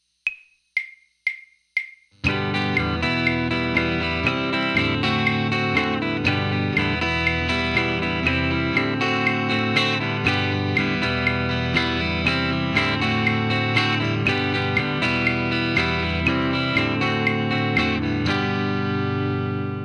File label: practice chord